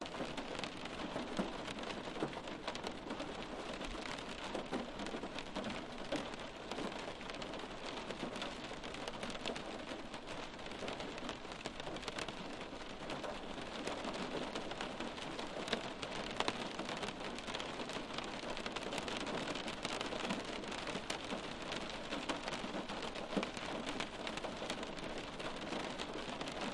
raindrops on a car window steady recorded indoors loop; Sound Ex Machina